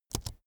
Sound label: keyboard laptop space press fast soft